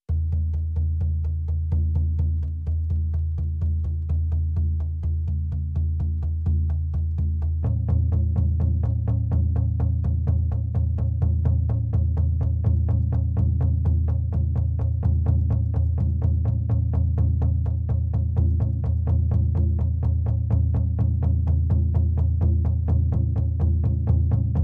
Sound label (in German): trommelreise